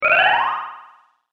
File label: Magic Power Up; Magic Sound Effects